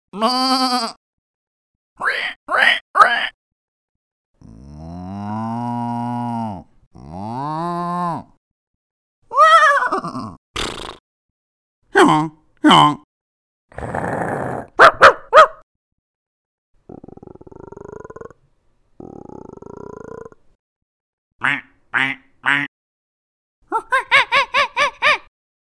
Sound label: animal sounds